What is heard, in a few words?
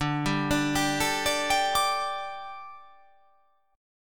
D Suspended 4th D, down